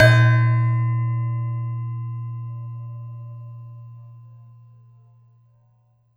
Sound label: Gender, Gamelan